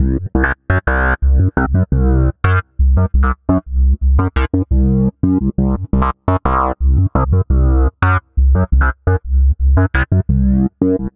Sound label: Synth Bass